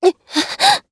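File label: Damage, Japanese; voices; heroes; Lorraine; kingsraid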